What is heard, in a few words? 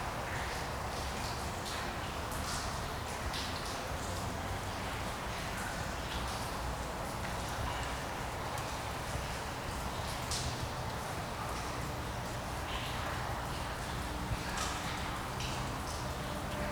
Water Dripping; SFX; IRL Recorded